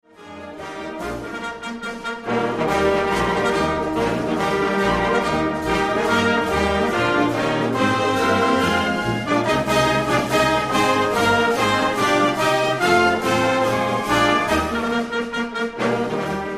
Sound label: victory march; lehigh